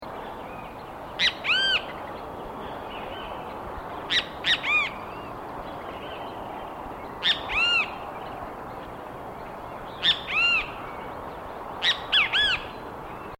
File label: great kiskadee calls